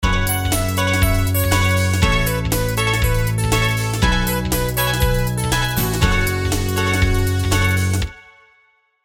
Wavestation Belarus

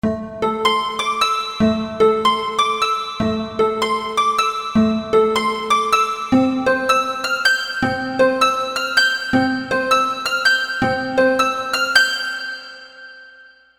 D-50 Harp; Nervous Harp

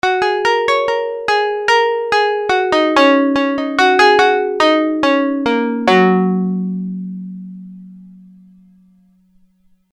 FB01 Dawn Rises

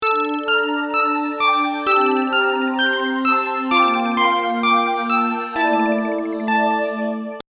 NX5R Snow covered Treetops

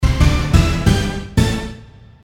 TG100 Orchestral Hits

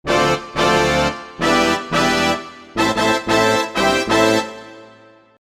X3R Announcement

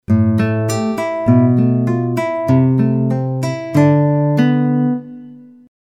M3 Nylon Guitar

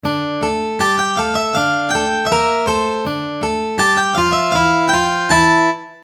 K2600 Rondo

